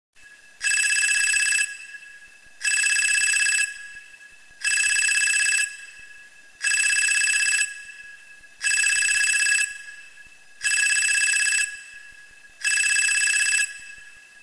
iPhone 12 Ringtone

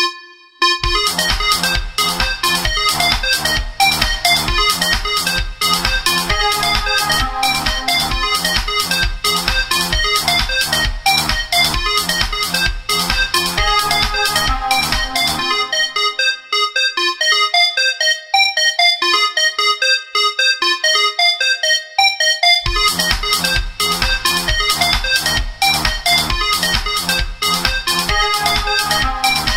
Oppo Ringtone

